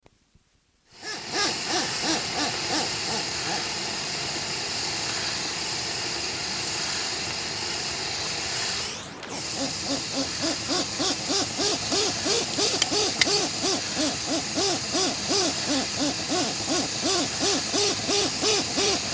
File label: westland black petrel song; conservation, native animals, birds, bird song